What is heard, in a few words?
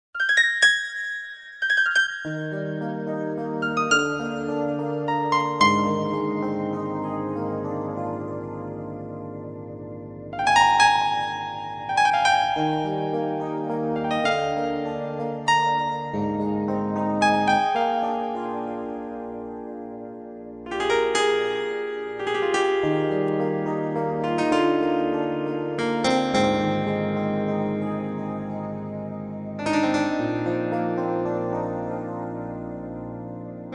waltz